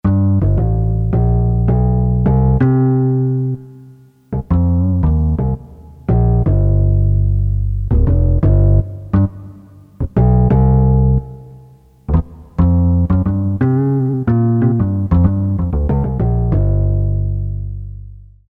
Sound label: A. BASS, Korg DSS-1